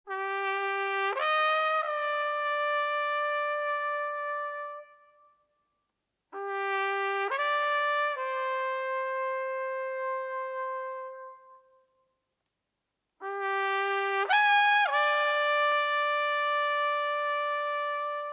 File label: rainfall big band